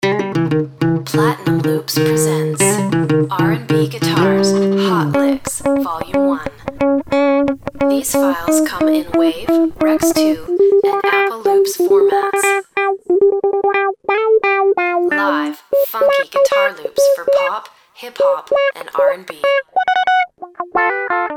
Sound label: rnb guitars hot licks